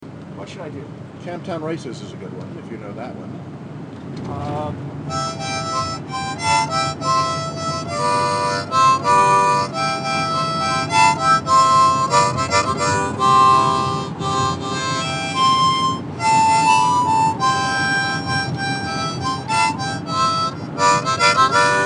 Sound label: Harmonica; Brin